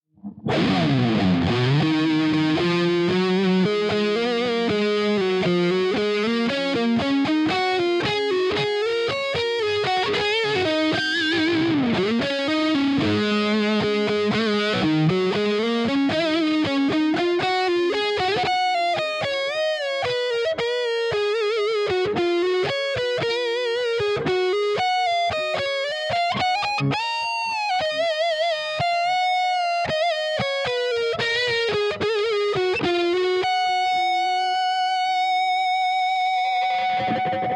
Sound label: Dist Mid, electric guitars; Gusta EX All Black MOO Big Ass Signature